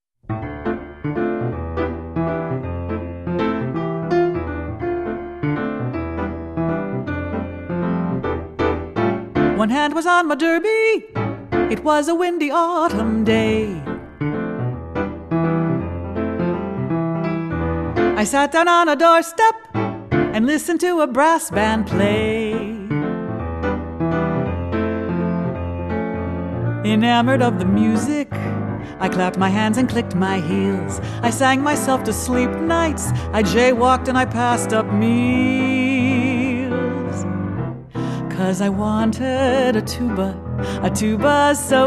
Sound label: Tuba